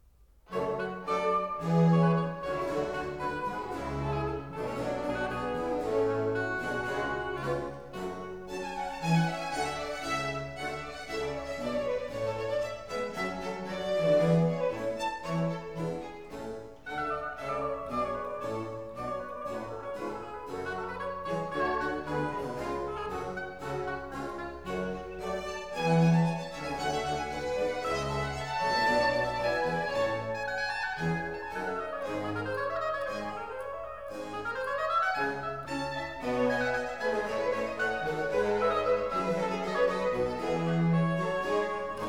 Concerto for Oboe in G Minor, Allegro; Handel

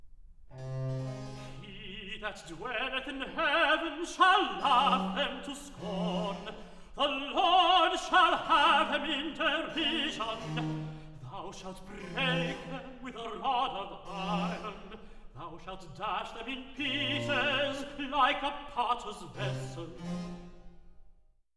recitative; He that dwelleth - Thou shalt break them; Handel; Messiah, HWV 56, Part II

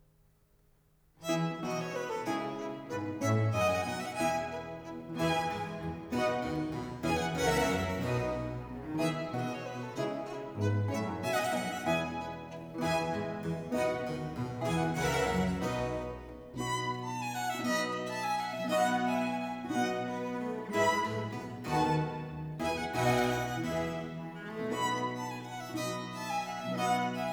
Concerto grosso Minuetto (Vivace); No. 9 in F Major; Corelli; Concerti grossi, Op. 6

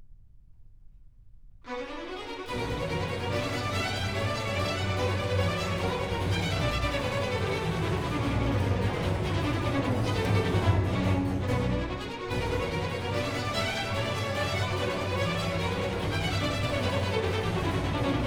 Sinfonia; Act 3; Handel; Ottone, re di Germania; Ottone, HWV 15

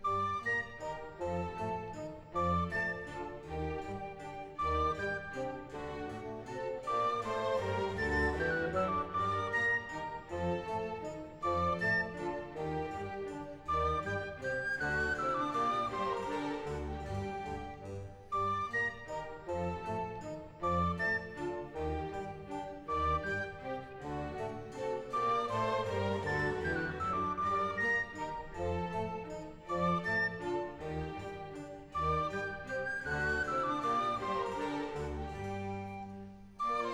Water Music Suite in G Major; Handel; Fireworks Music & Water Music

